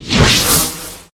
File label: Crab Arm Attack; unsighted; enemies